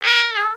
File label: parrot chatter; creatures organic